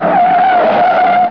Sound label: SKID